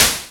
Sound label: Internal Drums, Roland JD800